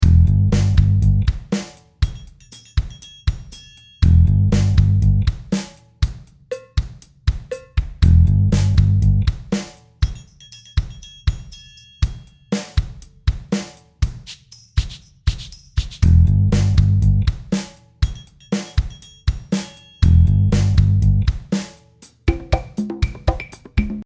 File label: bass, blu